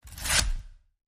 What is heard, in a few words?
Quick Scissor Cut